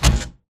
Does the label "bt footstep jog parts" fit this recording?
Yes